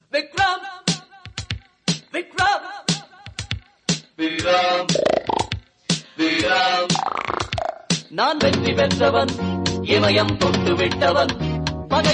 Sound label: Tamil Ringtone